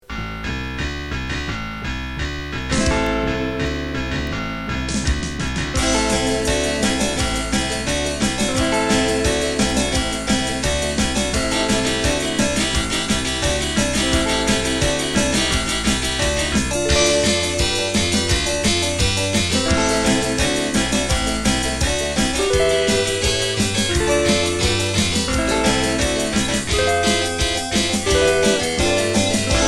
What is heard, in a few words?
Harpsichord Thing (with guitar)